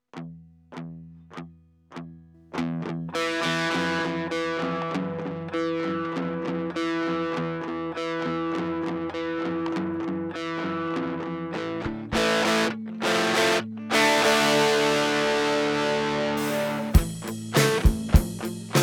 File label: Drums